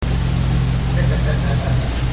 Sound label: Laughter; deep male laughter